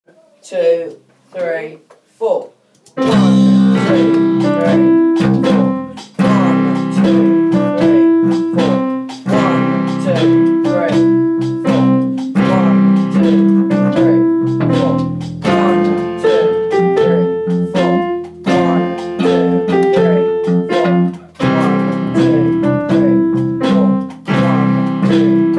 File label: 12 bar blues band